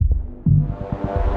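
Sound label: Uneasy Bass Pulse G minor 130 bpm; MIDI Clips; Tonal; Atmospheric; Ableton; Factory Packs; Mood Reel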